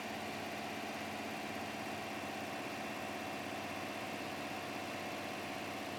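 Subaru Impreza GD WRX - Onboard - Engine - 2k rpm loop mono; Soundholder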